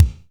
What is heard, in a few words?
Reverb Kick Snare; PS-37 Dance Synths; Percussion